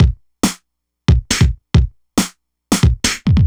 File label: drums, Hardcore Breakbeat, 138; Big Fish Audio; Hardcore Breakbeat Action; B Files